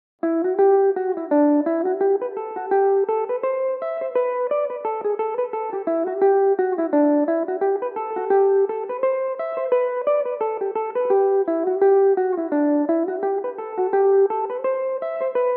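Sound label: G major, Irish mandolin; matinee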